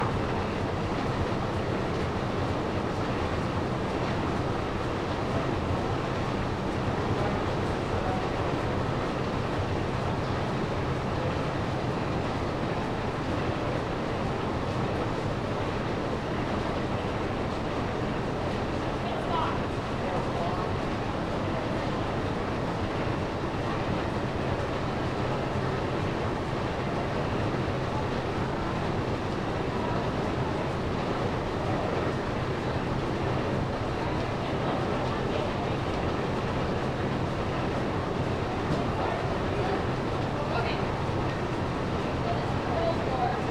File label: First floor mill atmo